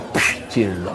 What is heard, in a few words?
pff